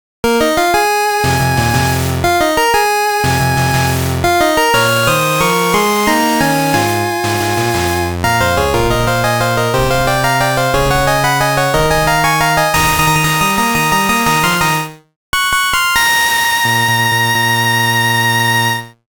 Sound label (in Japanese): 幻想のファンファーレ